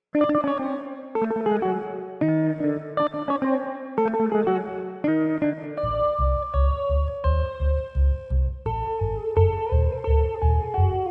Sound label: Symphony in Raga Viswambari